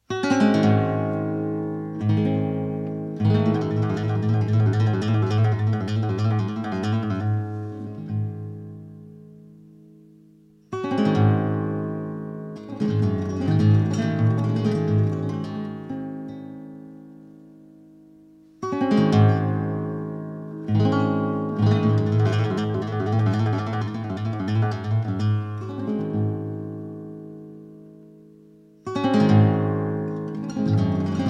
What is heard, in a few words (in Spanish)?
Tarantas, flamenco guitarra